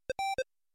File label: Chicken